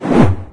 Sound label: scanner near miss; Garry's Mod; hl2